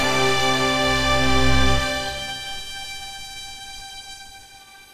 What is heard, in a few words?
victory horn